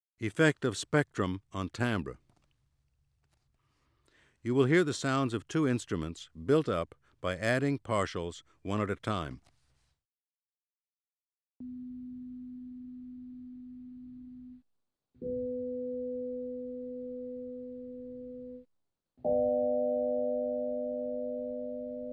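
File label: Effect of spectrum on timbre, Auditory Demonstrations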